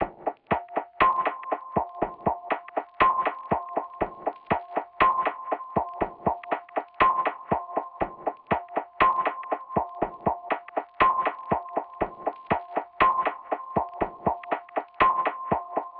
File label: 120 BPM F Min Percussion; Trap; Melodies; Loop Stems; Cymatics; Trap Starter Pack; Delirium